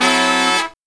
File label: LOW CHORD, Killer Brass Riffs